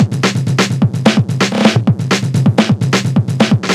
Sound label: Housebeats, 128BPM; Vengeance Samples; VEH2 Loops